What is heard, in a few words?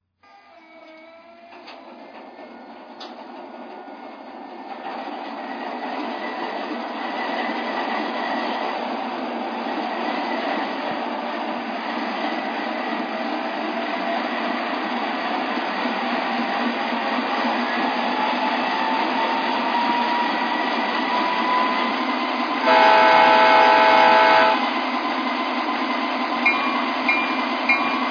es44ac, electric trains